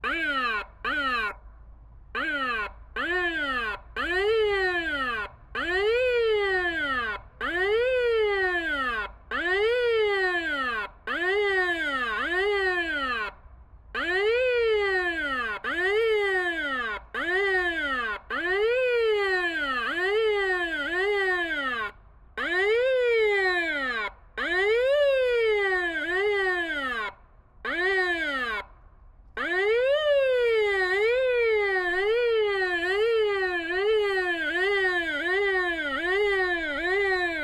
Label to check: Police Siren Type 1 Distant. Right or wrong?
Right